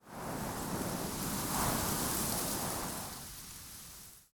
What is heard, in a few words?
Forest Open Glade Wind Gust Dry Grass, Day; Ambience